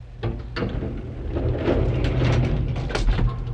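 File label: Elevator Door Closing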